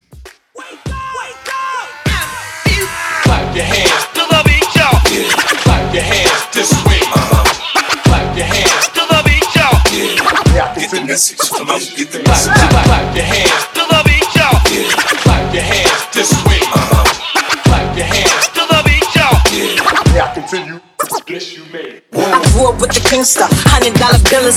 Club Mashup Scratch In Outro 100 BPM

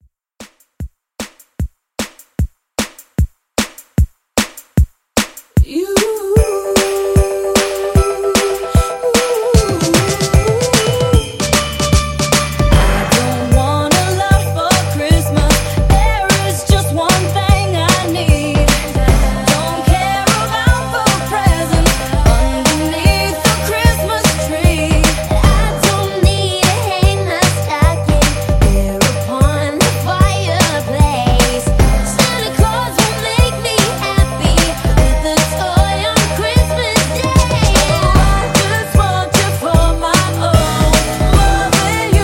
Re-Drum 151

